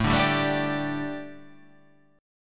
A 7th Suspended 4th, chord

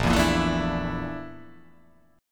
A sharp Major 7th Flat 5th A sharp

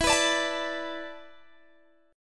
E 7th Suspended 4th, Guitar, Open G

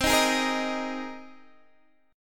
C Minor 7th Sharp 5th C Guitar Open D

